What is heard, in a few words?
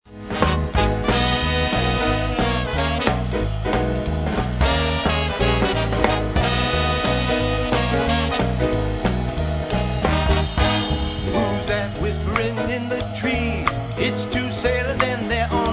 vocals